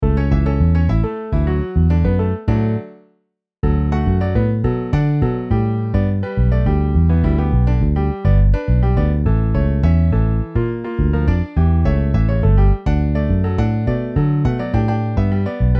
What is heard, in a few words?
Piano Bass